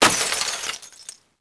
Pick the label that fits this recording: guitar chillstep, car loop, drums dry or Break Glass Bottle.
Break Glass Bottle